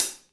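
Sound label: HATS CLOSED, Drum Kits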